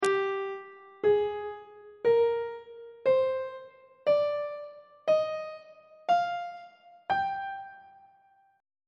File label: g phrygian mode on treble clef